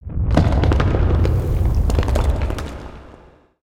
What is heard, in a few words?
Earth Quake Rumble Rock Slide; ninjago